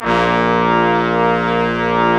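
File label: BRS Quintet, brass quintet; Roland L-CDX-03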